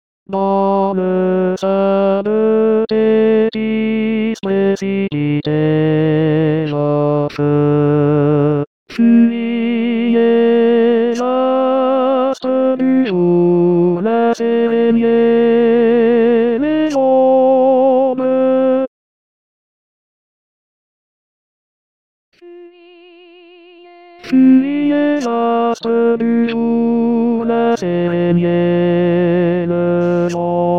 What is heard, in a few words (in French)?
sein thetis tenor; 2022 st jean